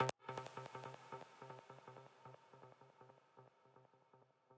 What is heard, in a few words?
arp